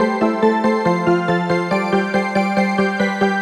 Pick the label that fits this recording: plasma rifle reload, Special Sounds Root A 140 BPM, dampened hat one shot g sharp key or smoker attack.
Special Sounds Root A 140 BPM